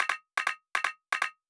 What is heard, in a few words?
obnoxious clicks; M8; percussion and foley